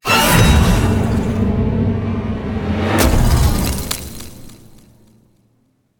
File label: activate; smite; kuzenbo